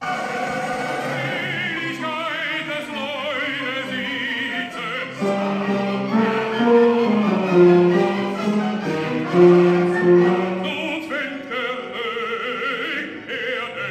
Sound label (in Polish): tenorzy; cardillac